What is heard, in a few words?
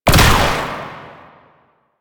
Ranged Weapon Fire; Warframe; Necramech